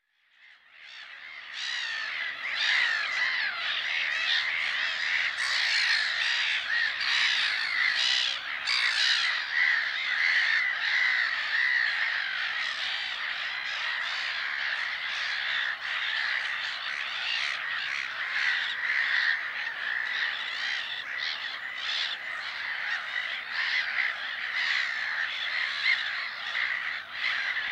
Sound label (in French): mouette rieuse